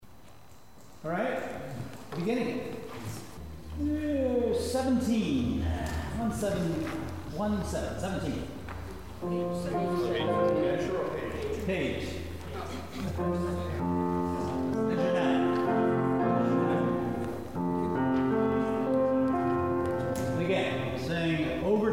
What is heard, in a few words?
Choir 3 only; rehearsal files